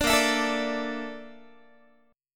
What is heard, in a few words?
A sharp Major 7th Flat 5th A sharp Guitar; strummed